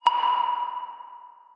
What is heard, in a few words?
chime